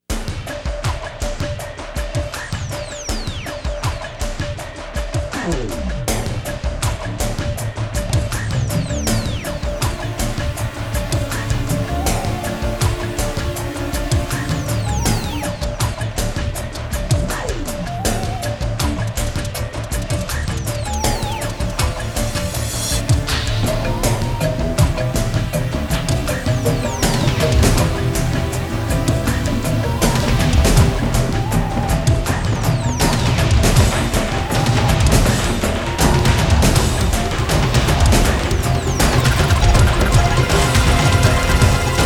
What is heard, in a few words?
Instrumental